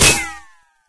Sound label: hit heavy metal; weapons; source engine; dod